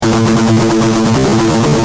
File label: G Jam, Guitar; Industrial